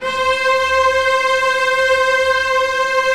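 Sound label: celli sustain c5; Sonatina Symphonic Orchestra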